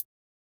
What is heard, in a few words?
heartbeat; osu!; Luminance - Fluorescence